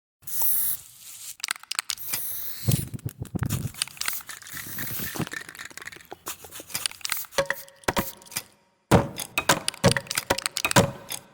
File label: graffiti sound; lvov